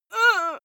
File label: female hit; soundpool